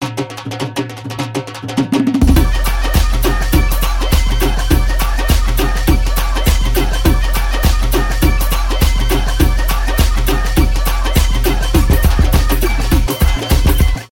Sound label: Beat Tumbi Dance Beat; Punjabi